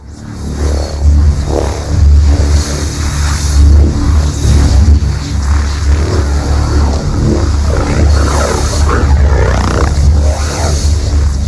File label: Psi blades